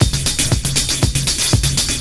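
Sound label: CYMBAL, 70 s Breakbeats; USB Soundscan vol.46; AKAI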